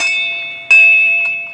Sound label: buoy